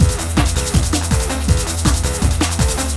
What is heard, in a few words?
drum loop